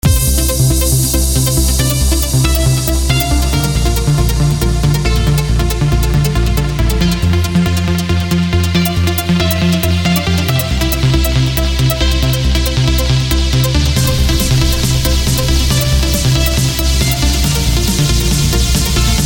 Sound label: trance acid